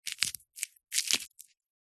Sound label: industrial electrical tape handling sticky; zapsplat